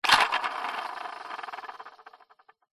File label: leisure toy truck plastic wheel spin freewheel